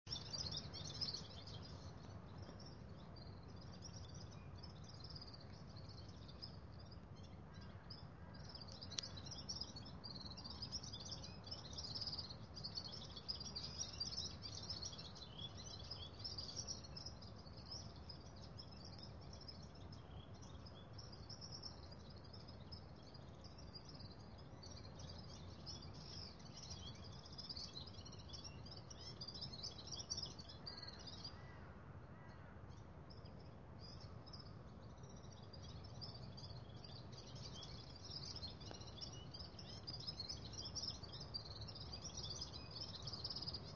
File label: Red-fronted Serin Serinus pusillus; Birds of Ladakh